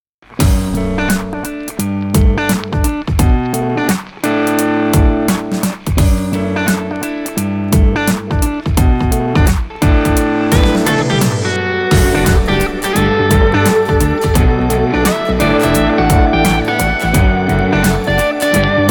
Eight guitars

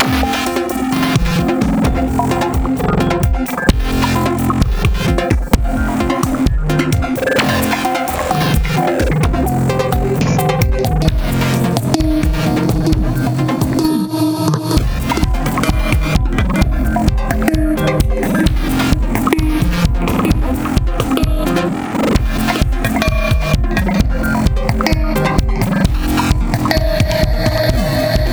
Security code Error Effect

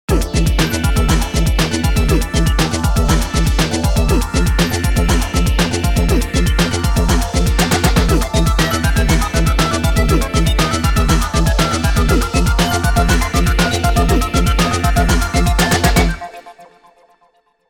funk dive

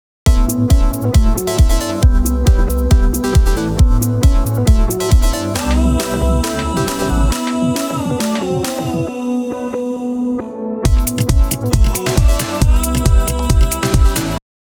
kicks

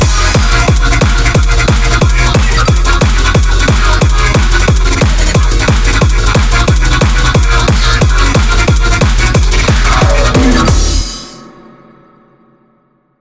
ColourBass Hardcore; Optical Artifact 8 Bars Shortcut Edition; 純次

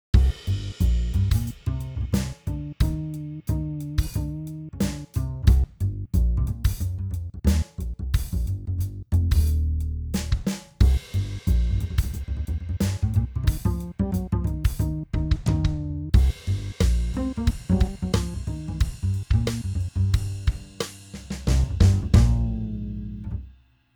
bass words

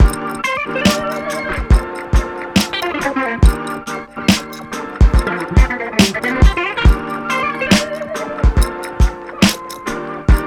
124 Old School Hip Hop

